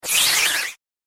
Air Whoosh And Whistle

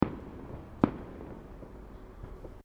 Fireworks Multiple Firecrackers; Backgrounds; Crafted Signals

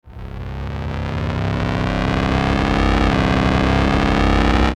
Ascend Buzz Fuzz Synth Stab; Blastwave

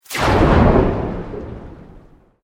Sweep Organic Medium

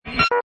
Hi Tech Panel Servo Button

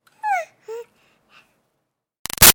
Baby 6 Month Old Coo Happy; Voice; Babies; BigRoomSound

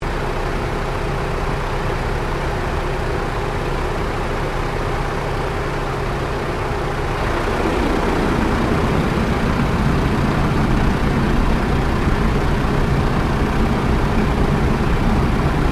AIRCRAFT T-28D TROJAN LAND INTERIOR; Airplanes; Vehicles

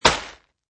Sandbag Dropped; Sandbag hit. Drop sandbag on ground.

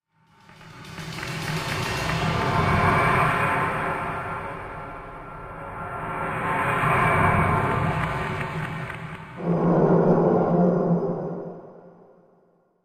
Planet Reverb Ambience, science fiction

